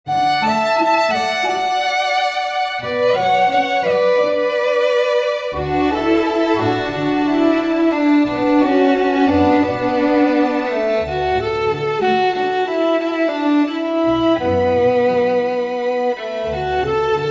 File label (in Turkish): keman zil sesi; cep telefonuna keman zil sesi